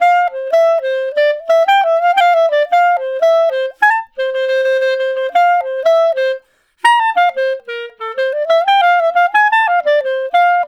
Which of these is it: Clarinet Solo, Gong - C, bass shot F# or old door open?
Clarinet Solo